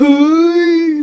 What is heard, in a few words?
bark